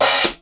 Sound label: Acoustic Open Hat